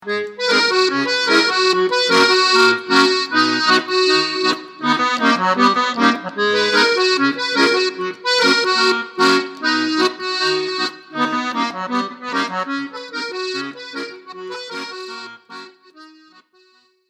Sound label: Polka